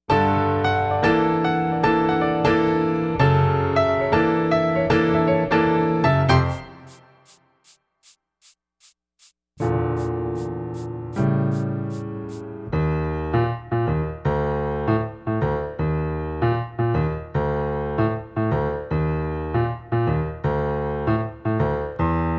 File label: improv track